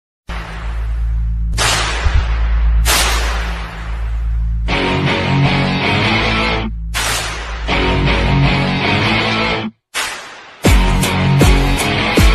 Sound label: aditya varma original bgm ringtone